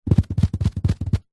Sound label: cat run carpet; animals